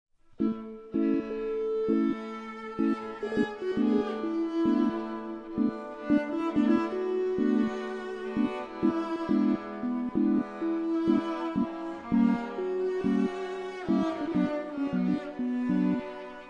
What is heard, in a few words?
Sync Sine Soni